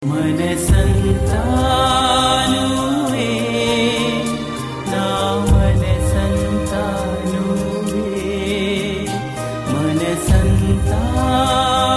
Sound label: Telugu